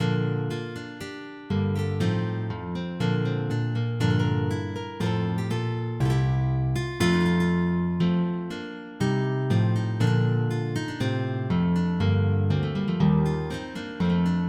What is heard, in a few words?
Gavotte